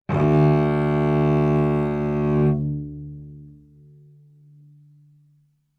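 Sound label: Cello arco fortissimo sul C D2; MIS Pitches - 2014; Strings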